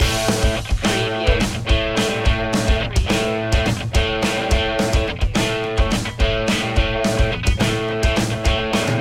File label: wearing the sunshine loop; rocky